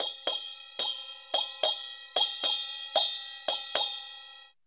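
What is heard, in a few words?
CHINA SM10 R, China cymbal; Spectrasonics - Heart of Asia CD2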